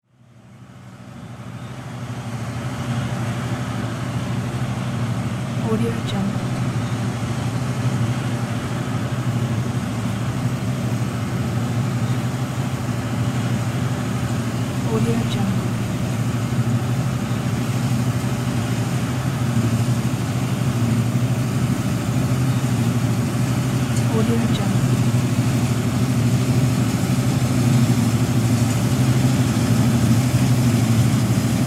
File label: combine harvester